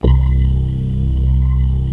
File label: brush loop; Mo' Phatt; Digital Sound Factory Mo' Phatt